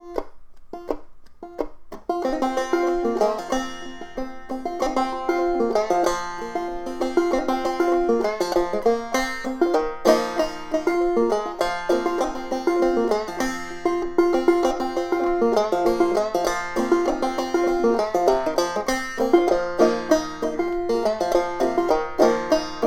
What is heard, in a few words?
horse hill, banjo